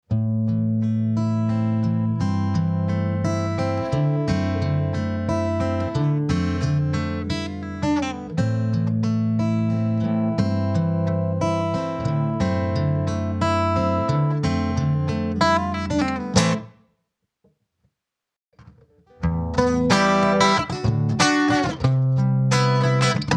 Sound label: Gibson J-200; James Tyler Variax